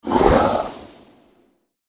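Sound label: werewolf death; oboroten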